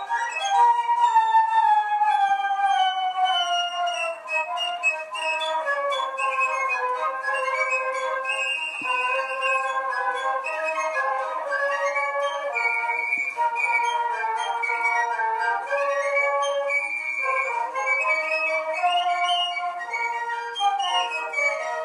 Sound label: piccolo; gakki